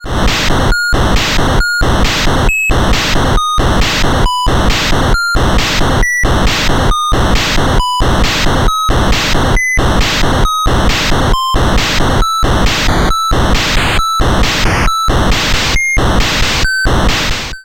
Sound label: sample slow